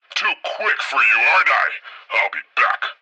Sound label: pre reload; Blind Drive